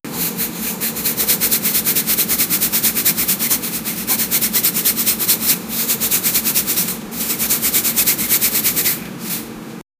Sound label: painting sound, craft